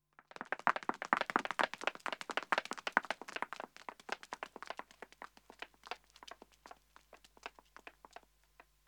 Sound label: Pavement Running 2 women depa, Footsteps; The BBC Sound Effects Library